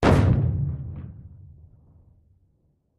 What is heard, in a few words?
30 Feet Primacord